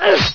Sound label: oof